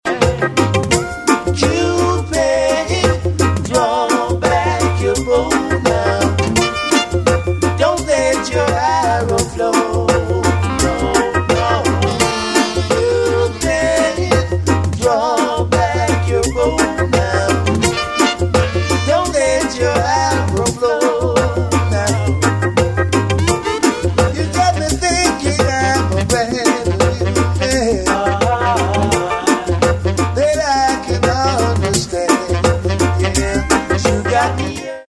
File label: SAX